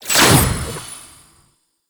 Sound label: Mirror Defense Rune Shot; Warframe; Entrati